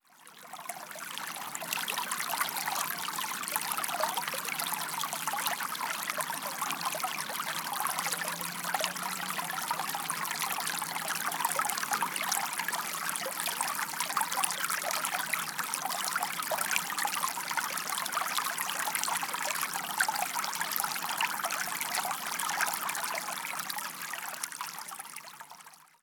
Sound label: Water River Splashing Smooth